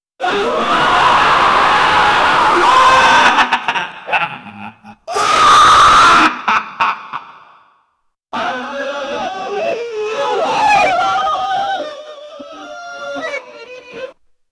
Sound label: sounds of mad laughter an, agony